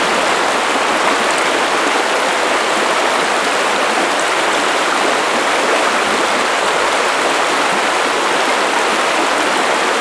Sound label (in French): eau